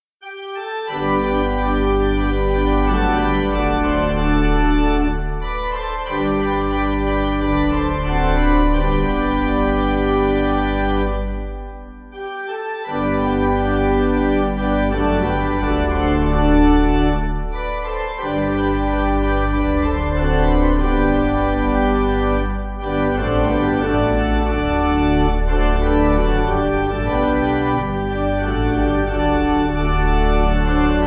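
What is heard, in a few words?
pipe organ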